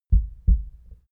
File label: heart beat double